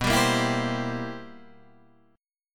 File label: C 7th B, C7/B chord; strummed; JGuitar